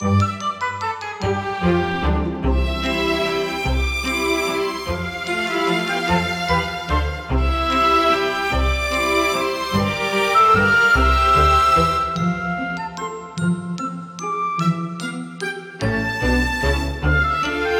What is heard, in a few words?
B Menu Event